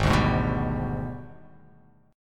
Ab Minor Major 7th Flat 5th, down; AbmM7b5; strummed

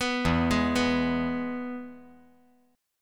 E-Major E

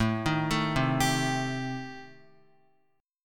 A-Minor 7th Flat 5th, Guitar, chord; arpeggiated; JGuitar

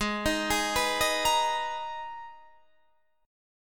G sharp Minor Add 9th G sharp; madd9:1,b3,(5),9; 16,18,18,16,16,18

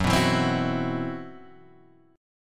C Major 7th Flat 5th F sharp

